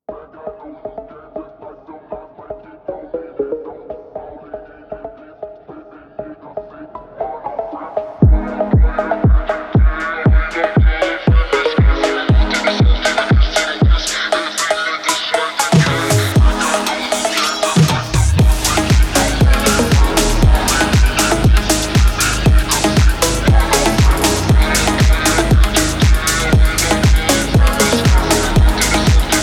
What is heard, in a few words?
phonk